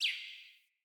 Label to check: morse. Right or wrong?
Wrong